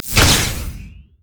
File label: shock blast; LoL; jayce